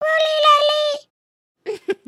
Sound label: train sit, emotes